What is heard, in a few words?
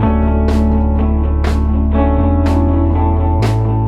song loop; recorded: 2011-11-06 good clean electric sound. with drums. pretty slow solid groove.